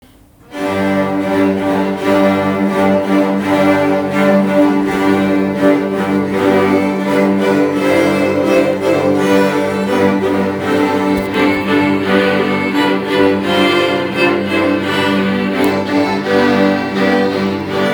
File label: Viola; Festival Mattachins; extra-curricular music